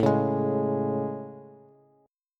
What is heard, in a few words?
A Major 7th A, Bass; AM7; strummed; JGuitar